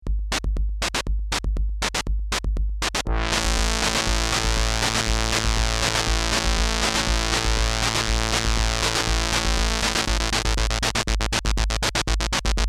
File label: moog chop; radioace; By Mike Walters - Chapel Hill, North Carolina